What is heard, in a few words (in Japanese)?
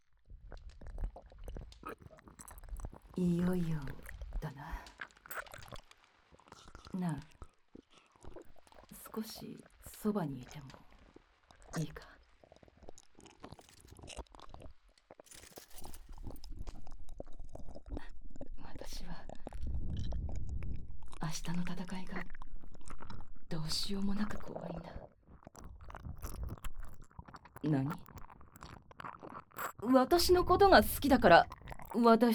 耳舐め ディープキス 立ちバック 正常位 騎乗位 する音声